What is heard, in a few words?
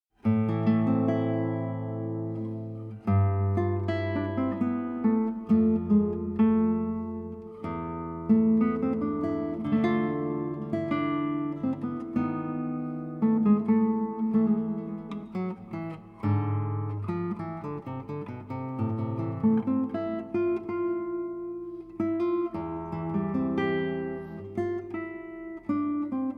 Grave (Guitar version); Johann Sebastian Bach